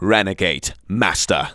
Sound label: vox; Ueberschall; Techno Trance Essentials